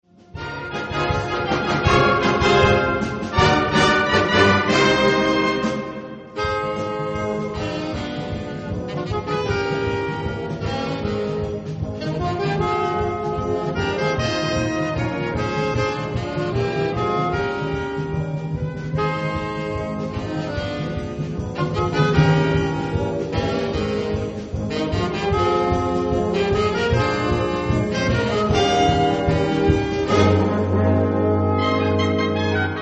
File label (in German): Sonate Satz4 Saxe